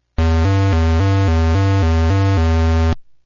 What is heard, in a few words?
gameover; Whiteholl; invader